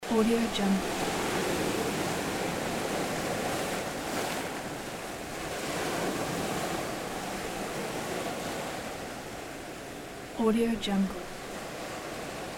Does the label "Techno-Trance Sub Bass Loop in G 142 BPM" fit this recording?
No